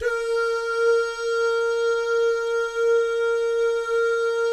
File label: "DOO" GUYS, Vocal Jazz Fx